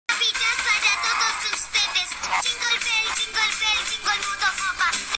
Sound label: jingle bell